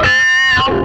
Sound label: whammy fx, guitar